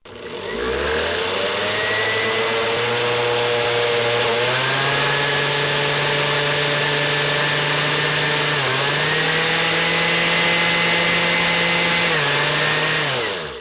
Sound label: household